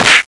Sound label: hit